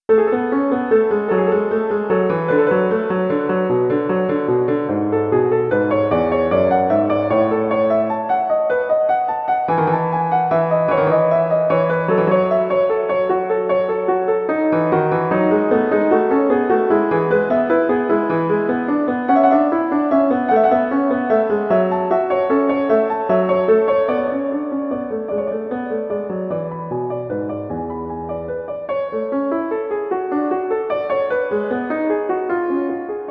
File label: inventions a 3 voix piano; bach johann sebastian